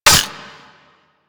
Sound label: Mech, Weapons Magnum; Warframe; Tenno; Magnus Prime